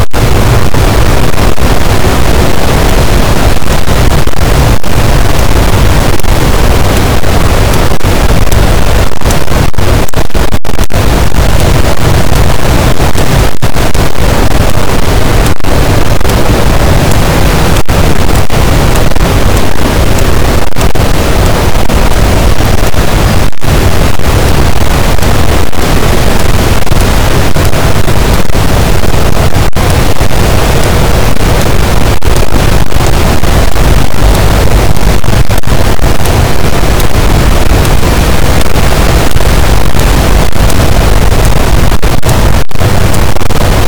cachalot positif, boat, Physeter Macrocephalus

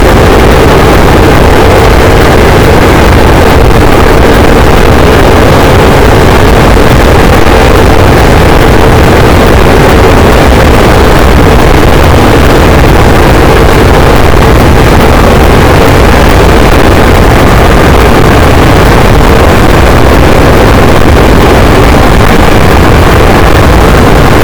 cachalot negatif, boat, Physeter Macrocephalus

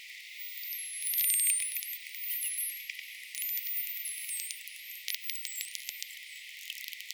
Dauphin bleu blanc

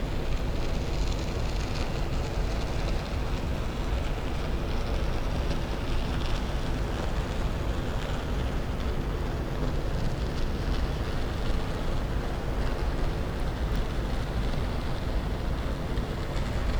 cachalot negatif, Physeter Macrocephalus

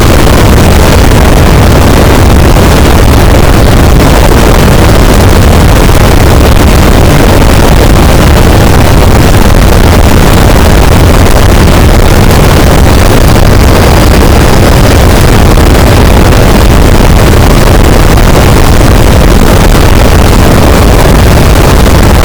cachalot positif, boat; Physeter Macrocephalus

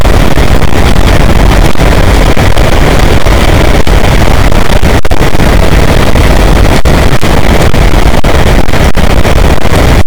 cachalot, boat; Physeter Macrocephalus

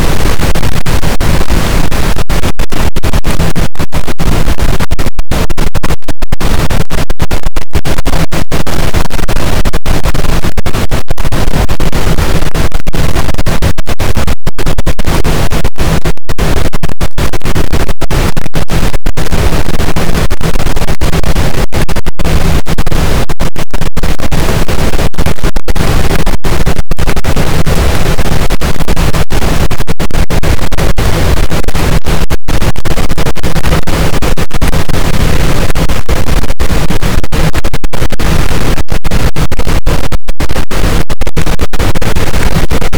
cachalot positif, boat, Physeter Macrocephalus

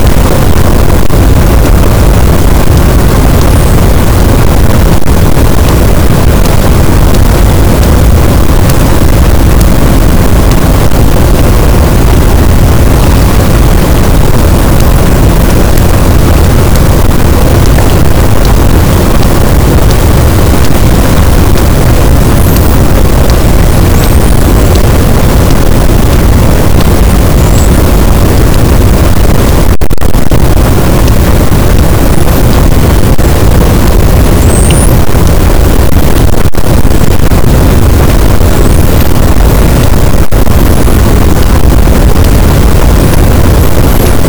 cachalot positif, boat, Physeter Macrocephalus

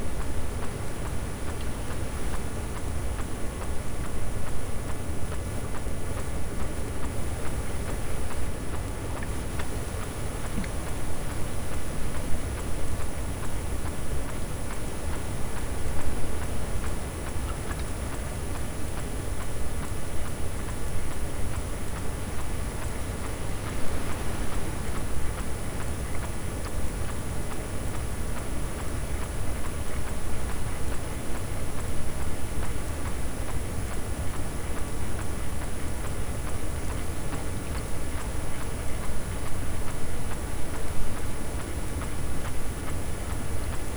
cachalot negatif, Physeter Macrocephalus

